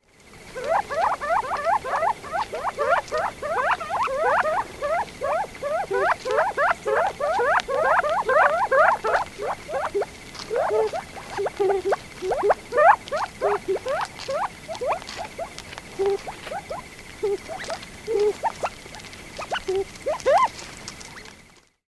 Callipepla gambelii; Chordata; LandBirds

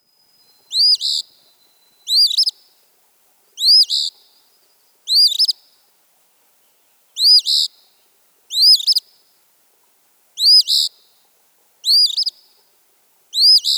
Sayornis phoebe; Chordata; LandBirds

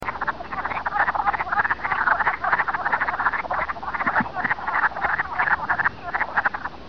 Lithobates sylvatica

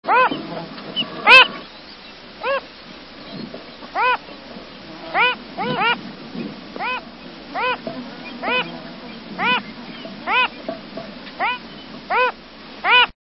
Phalaropus tricolor, Water Birds; Chordata

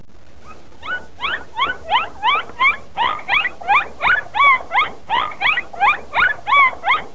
Tursiops truncatus; Chordata

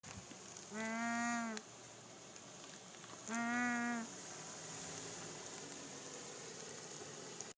Branta sandvicensis; Chordata

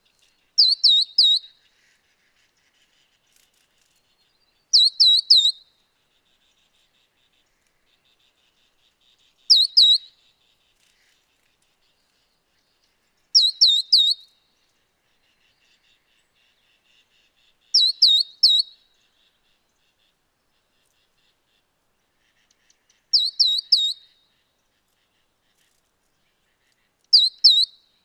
Auriparus flaviceps; Chordata; LandBirds

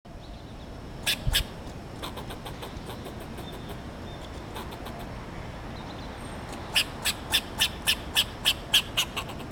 Sciurus niger, Mammalia; Chordata

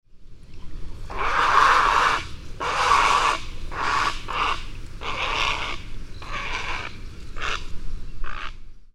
Ardea herodias, Water Birds; Chordata

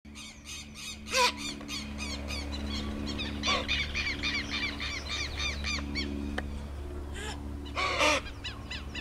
Hydrochoerus hydrochaeris; Chordata

